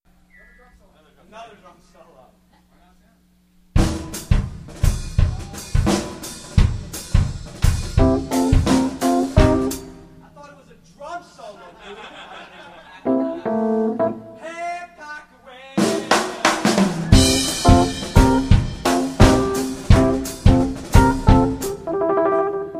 Drum Solo

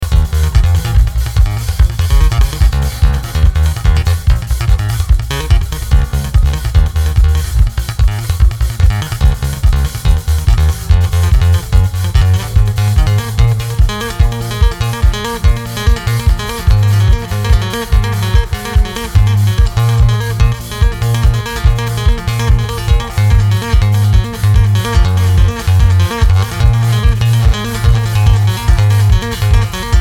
Drums

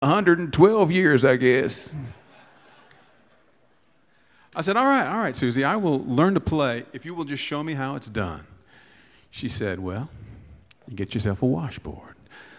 Washboard Piece

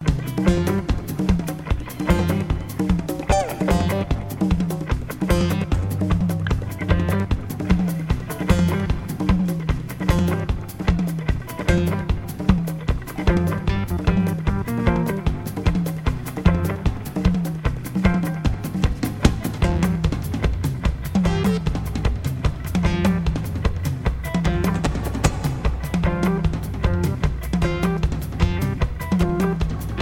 Percussion Jam

